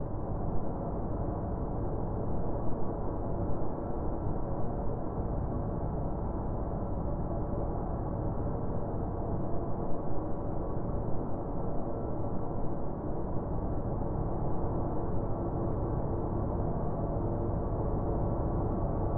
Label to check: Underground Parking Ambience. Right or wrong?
Right